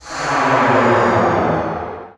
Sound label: NOIS; XXL 800 Production Effects